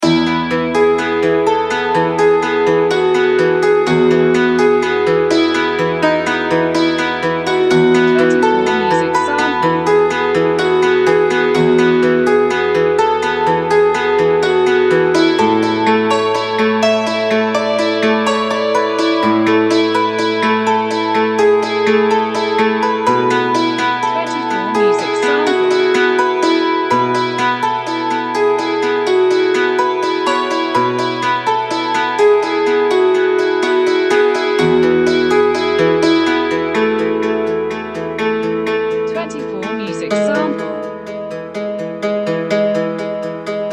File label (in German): hackbrett